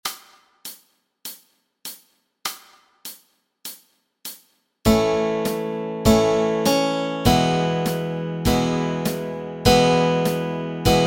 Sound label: melody and chords